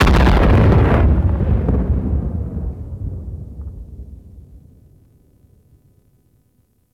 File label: Explosion Space Battle Distant; Ambience; Warframe